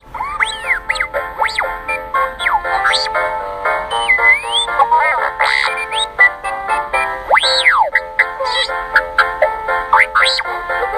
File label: jingle bells ringtone